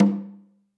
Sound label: CONGA, ST CONGA; AKAI S-Series CD-ROM Sound Library VOL-5